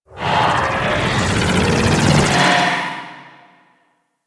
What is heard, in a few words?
Idle Agile Power; Warframe; Warframes; Decree